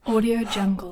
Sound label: male breathing individual heavy breathes big; human sounds